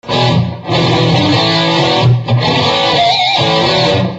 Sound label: pinch, pinch harmonics